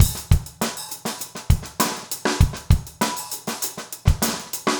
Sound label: basic funk 100